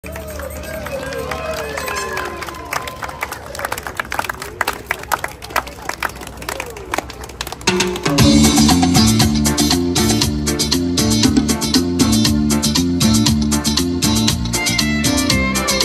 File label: violin cover